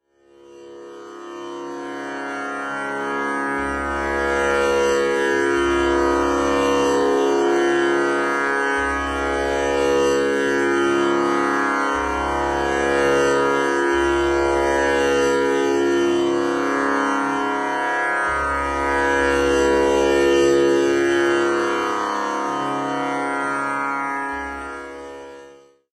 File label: Drone Tanpura; ragajunglism